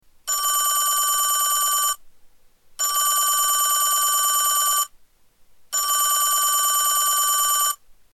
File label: telephone